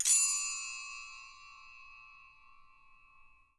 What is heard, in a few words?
Percussion & Harp; Miroslav Vitous